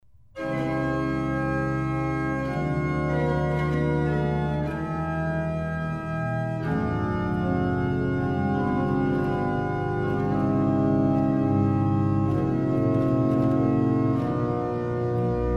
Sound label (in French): Fond d’orgue; Charpentier; Nivers; Livre 1 de Nivers